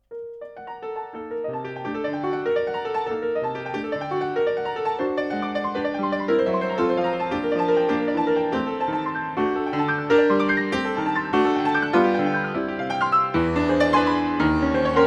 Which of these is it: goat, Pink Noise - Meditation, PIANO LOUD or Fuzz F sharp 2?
PIANO LOUD